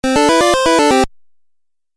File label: Musical Phrase Video Game